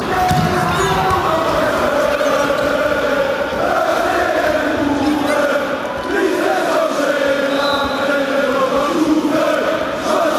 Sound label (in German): gehst du durchs viertel, fanchants